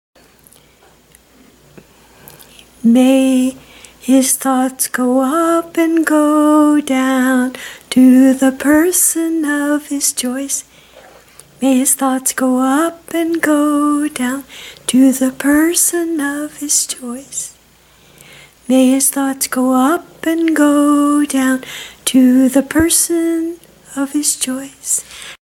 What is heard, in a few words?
lustful thoughts chant only